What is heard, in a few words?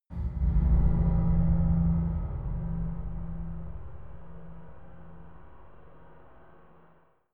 scary metal chime; impacts